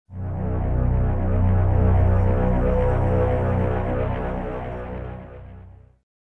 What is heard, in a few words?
Transition; Waterworks